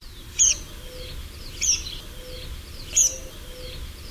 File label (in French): pipit des arbres